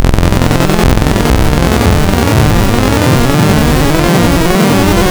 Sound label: Fractal FM Saw, wavetables; Architecture 32